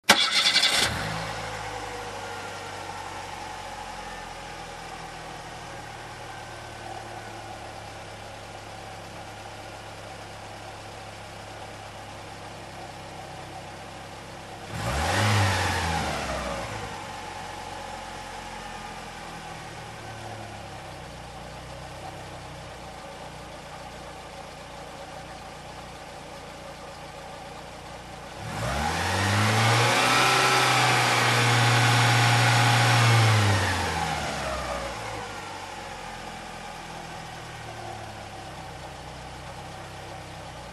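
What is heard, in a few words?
zvuk motora, motor sound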